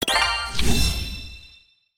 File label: Select card; Thunder Fighter